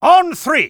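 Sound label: taunt rock paper scissors exert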